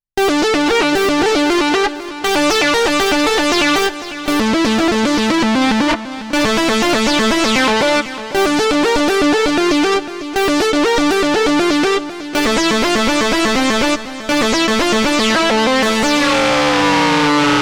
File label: yamaha montage 8 performance; os 2.0